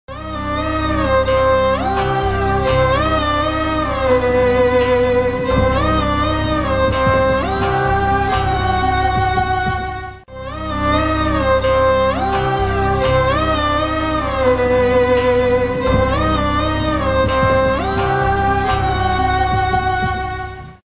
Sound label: emotional BGM ringtone; Pushpa; Mother